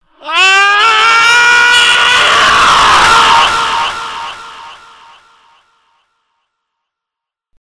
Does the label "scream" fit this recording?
Yes